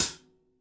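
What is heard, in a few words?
Hi-Hat, Drums Acoustic; USB Soundscan vol.10; AKAI; 90s sample CD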